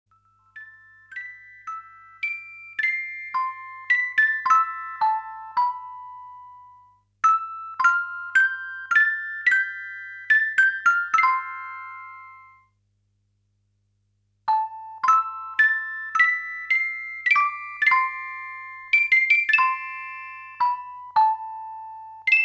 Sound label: Legend of the Divine Dragon Organ